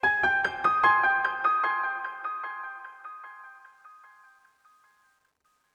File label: One shot Piano